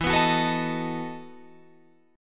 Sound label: E Major 7th Suspended 2nd Suspended 4th, Guitar, Drop D